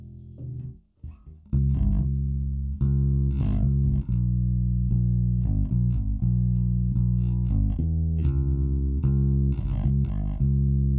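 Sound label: no drums, instantaneous, source; SiSEC11; underdetermined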